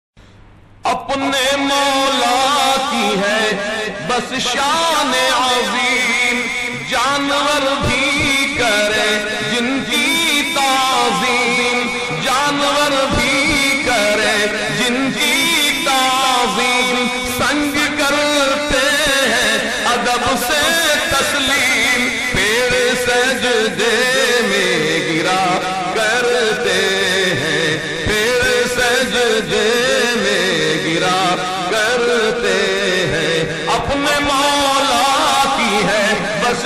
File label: reciting kalam; kalam-e-raza; apne moula ki hai bas shaan-e-azeem